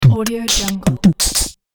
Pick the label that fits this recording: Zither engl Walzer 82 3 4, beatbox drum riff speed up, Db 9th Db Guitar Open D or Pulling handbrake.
beatbox drum riff speed up